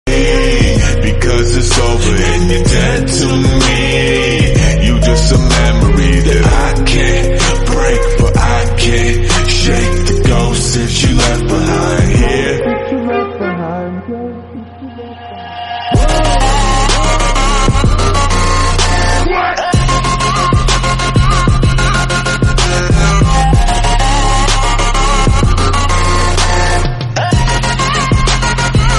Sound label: slowed reverb bgm